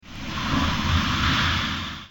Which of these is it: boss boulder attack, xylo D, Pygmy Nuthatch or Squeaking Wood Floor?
boss boulder attack